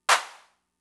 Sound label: Clap, Fricke MFB-522, Drum Machines